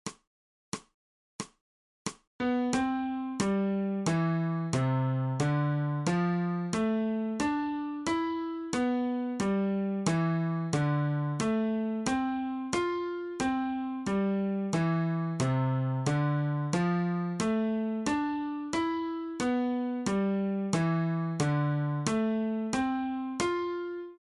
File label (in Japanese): 新フレットレス